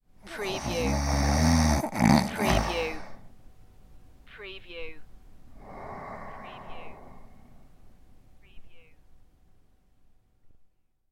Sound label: Snoring, Male Snore